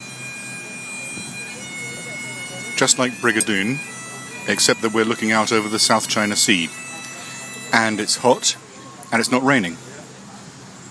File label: bag pipes on the beach